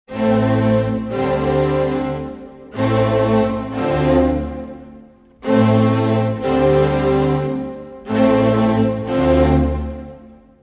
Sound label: 90 a min strings harm, Orchestral arrangements